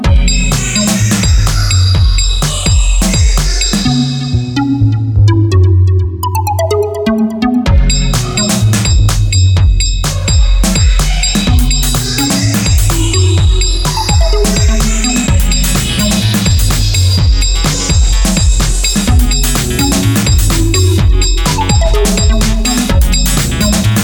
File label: machine drum